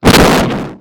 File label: cannon explosion; Pirat-Invasion 7